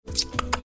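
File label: making mouth clicking sounds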